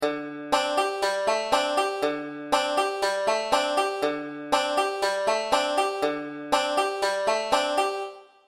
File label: d7sus4 hammer, banjo; beginning 5 string